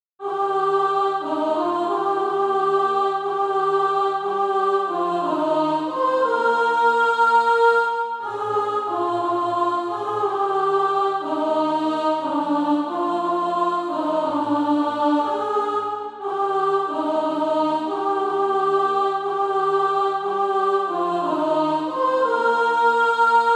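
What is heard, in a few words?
alto, Practise Music; Crown Him with Rejoicing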